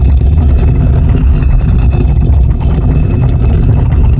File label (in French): porte pierre